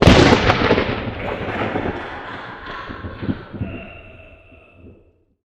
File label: ambient battle; World Of Warships